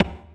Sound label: D STAB, Funky Underground